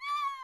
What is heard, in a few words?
Valkyrie Death Vocals